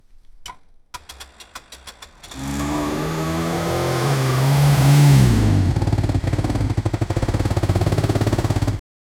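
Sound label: cranking scooter with kick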